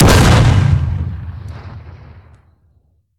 cannon fire 8pdr

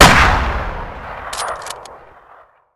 enfield fire

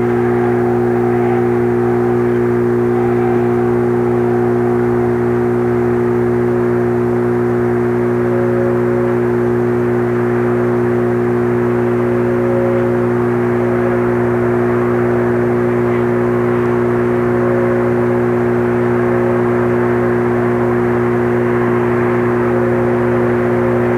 powerbox

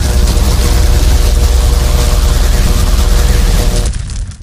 explosion low orbit particle cannon

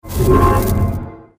stealth on

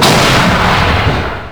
241mm gun fire

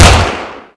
coax vulcan fire

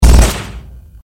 raider fire; nod

